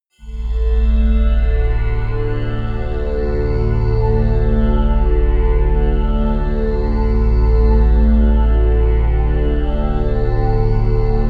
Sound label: drone simple big one